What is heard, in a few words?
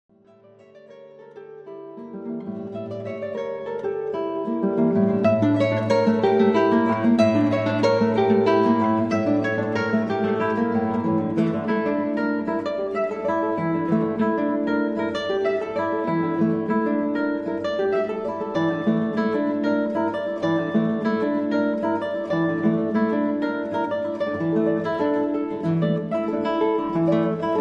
guitars; Nagoya Guitars